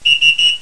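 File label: whistle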